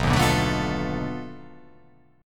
B 7th Sharp 9th Flat 5th, down

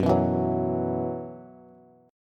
F sharp 7th Suspended 4th, down, Bass; strummed

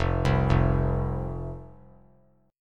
F 5th F, down, chord; F5 arpeggiated

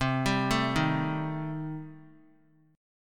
C Minor 6th, chord; Cm6 arpeggiated; JGuitar

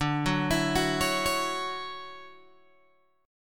D-Major 9th D down Guitar Open G

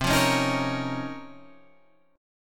C sharp Major 13th, chord

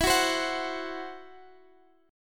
E Suspended 2nd Sharp 5th E, Guitar, Standard; x,x,14,11,13,14; strummed; JGuitar

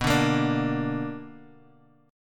B-Minor Major 7th B down Guitar Standard; BmM7 strummed; JGuitar

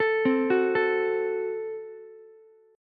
F sharp Diminished F sharp, Ukulele; arpeggiated; 14,12,14,12

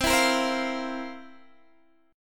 F Major 7th Suspended 4th C

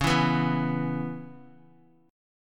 Db Minor 7th Db down Guitar; strummed; JGuitar

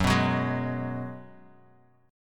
Ab Minor 7th Gb

